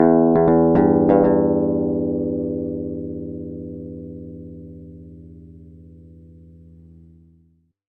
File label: drama 120 guitars